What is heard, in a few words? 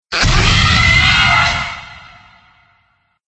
raptor call ringtone